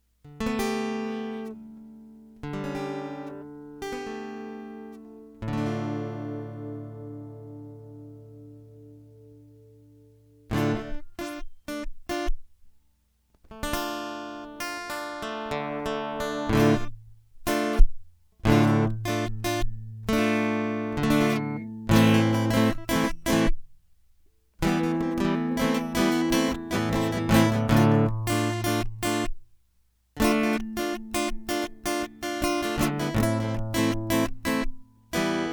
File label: Guitar Loop Dirty; hyper terrestrial; I Can Feel